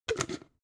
jar glass plastic close cap

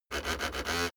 servo sequence

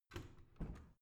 washing machine modern open soft

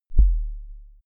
sub kick clean

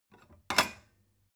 stone bowl shelve hard

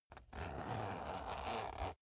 zipper use slow

